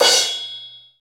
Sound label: Crash Stop, Cymbals; Roland BK-5